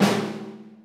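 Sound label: low metallics; Pro-Rec - Synthsonic; HIP HOP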